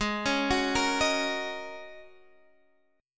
Ab 9th Suspended 4th Ab down Guitar Half Step Down; Ab9sus4